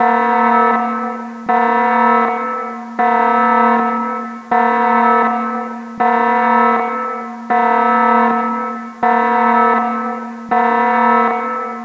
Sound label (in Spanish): alarma loop; ze base